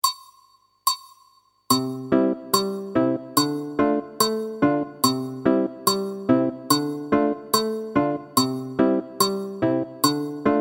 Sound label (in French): baryton